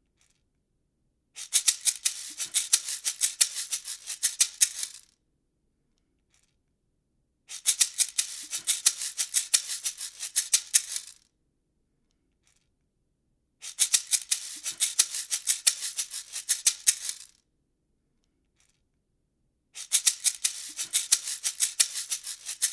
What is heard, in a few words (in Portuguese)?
GANZÁ